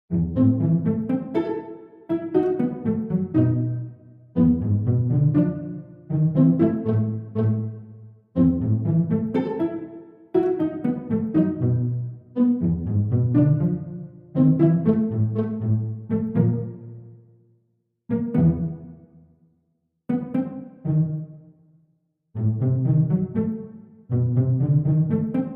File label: Background Music